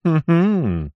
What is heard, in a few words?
human male laugh huh hmm